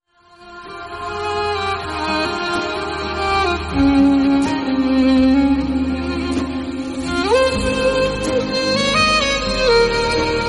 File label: Instrumental Violin, ringtones